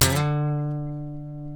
E funk, Guitars; Spectrasonics; Hans Zimmer Guitars VOL-1; TAKA FUNK

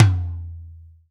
TOM 12; Best Service; Alpine Volksmusik

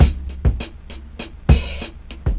100bpm, breakcore, breaks

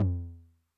Med Tune Long Decay, 909 LOW TOM; classic machines; LT - LOW TOM

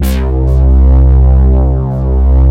ATTACK PULSE, TECHNO; Pro-Rec - Synthsonic; Z1 TECHNO

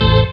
Orig House; Best Service Gigapack 1

